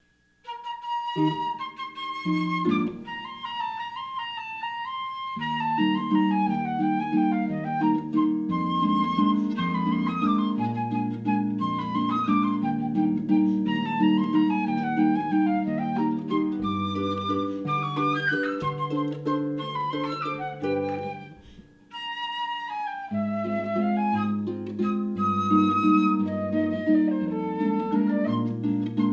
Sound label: Harp & Flute; Strauss- Die Fledermaus Overture